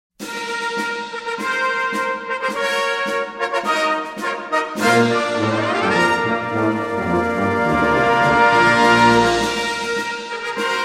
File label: Fanfare Jubiloso, Brass